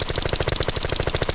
MP5 burst Fire

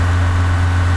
el generator engine

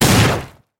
Grenade Expl; grenade exploding

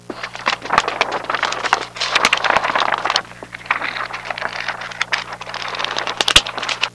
SHUFFLIN; book shuffling

